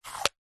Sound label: container plastic display case small lid slide close